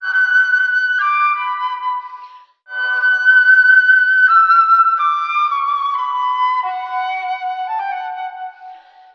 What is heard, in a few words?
flute